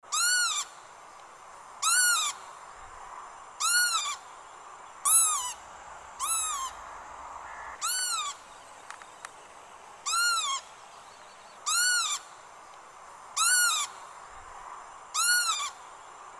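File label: lapwing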